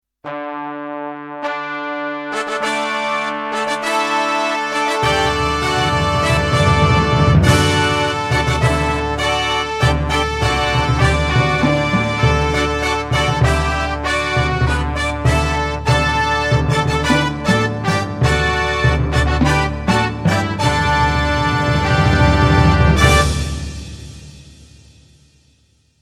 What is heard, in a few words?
Fanfare